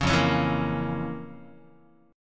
F Major 7th Suspended 4th Sharp 5th F, Guitar; FM7sus4#5 strummed; JGuitar

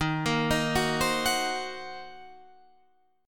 D sharp Minor 6th D sharp, down, Guitar, Standard; D#m6 arpeggiated

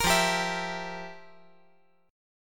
F Major 7th Suspended 2nd Suspended 4th; 15,17,15,19; down; 5G,4C,5E,5A; chord shape

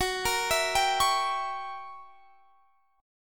Gb 7th Flat 9th Gb, guitar

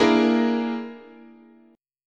A sharp 7th Flat 5th, guitar chord

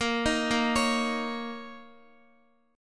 B flat Minor Double Flat 5th Guitar, chord; Bbmbb5; arpeggiated; JGuitar

